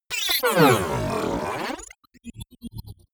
sci-fi electronics charge digital phase

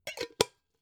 pot lid close

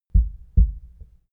heart beat double slow; comedy-cartoon; koerpergeraeusche

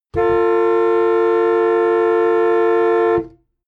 car horn medium interior hummer h3

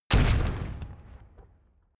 metal spike

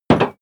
impact dull metal damped; soundpool

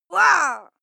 female fight shout pain; schreie; menschen

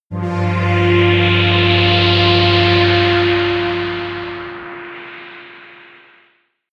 air trumpet wind high, drones

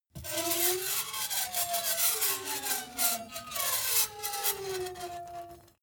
metal screech phaser edgy

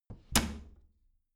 bathroom cabinet doors close heavy; objekte; tueren

